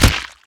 Fruit Breaking; angry birds rio

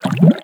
Object Exit Water

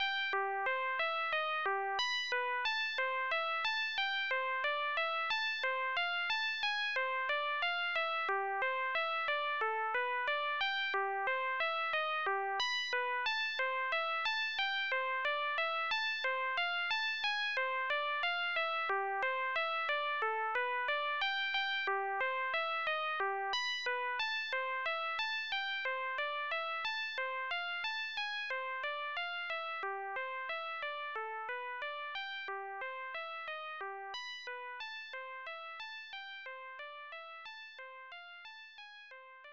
OPN FM MIDI gameover

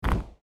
Lava Destroying; my cute commissar 2020 gamerip

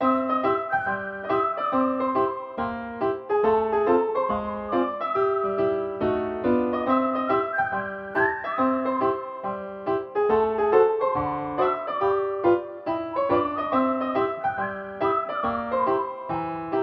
Bathroom Music

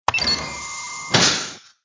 Menu Button Close; Angry Birds Space

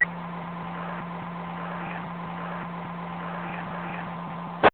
Police Radio Effect Very Long

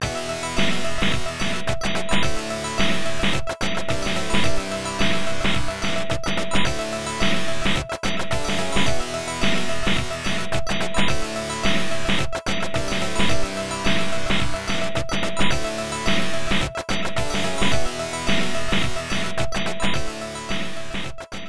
Ending; Garou Densetsu 2; X68000

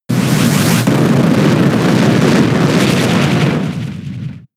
Eruption; pokemon sfx gen 6 attack moves xy oras

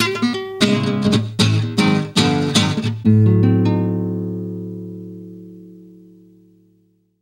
Spanish Jingle; Captain Rainbow; wii

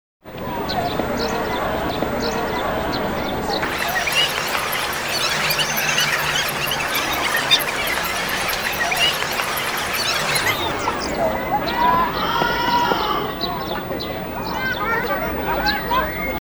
Ambient BGM

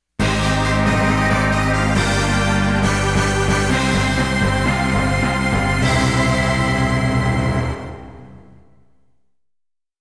Game Over (Game Over)

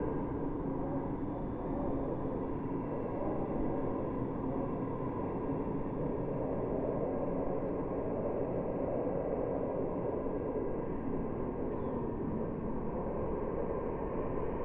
amb luncheon anxiety

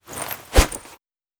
shadow grenade throw; Aragami

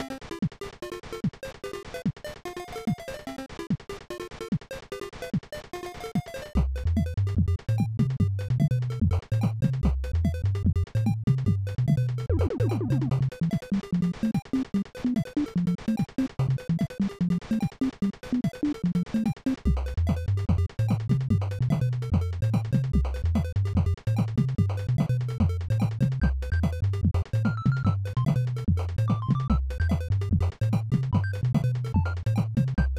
Bonus Level; Wrecking Crew 98

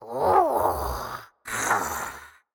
Death Vo; portal quest android; Yoda

